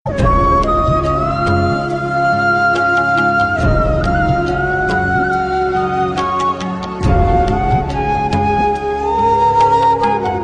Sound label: Jana Gana Flute; Republic Day